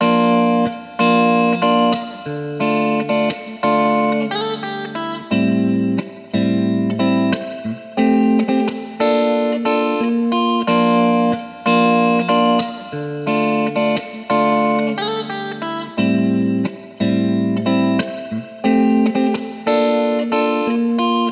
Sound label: Indie Rockin